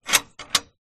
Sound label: household gate bolt movement